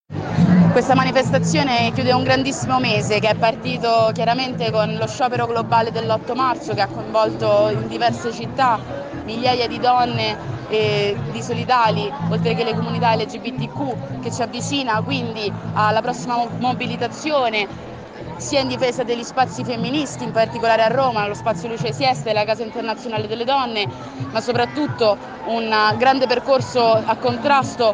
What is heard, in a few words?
viola; verona